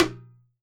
WORLD DRUMS MIXED DRUMS

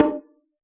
hip hop kit conga

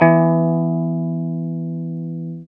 guitar 5th, e-guitars

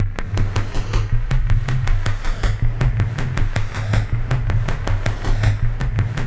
synth loops; Zero G; Malice In Wonderland

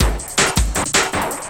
Drum & Bass, 160 BPM

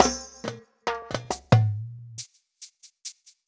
Percussion, Cinematic Funk Urban; Sonic Foundry (Sony Creative Software); Cinematic Funk Urban Tone Poems

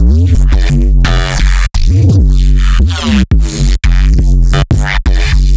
neurobass sound design

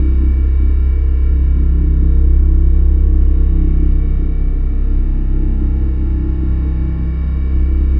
Drone Ambient; Drums & Drones

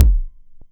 Kick, Roland MC09; Drums

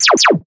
Blaster Fire, cartoon Foley Fx

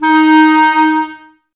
clarinets, Wood Winds

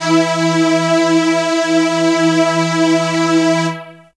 Strings G#4; Drum Bass; Zero-G; Total Drum Bass

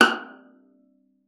VIOLA FINGER; Xsample VOL-5

